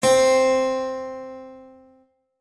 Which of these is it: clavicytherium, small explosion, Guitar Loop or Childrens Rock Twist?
clavicytherium